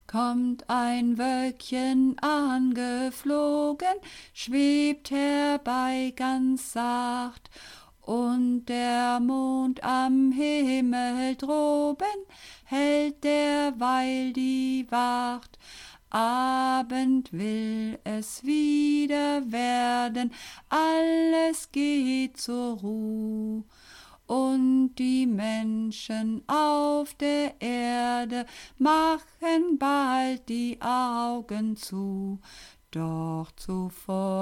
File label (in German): Sopran